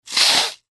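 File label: nose blowing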